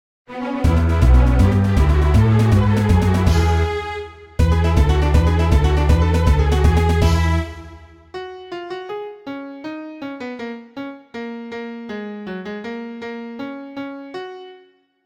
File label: Fanfares; magical drop series remixes and remakes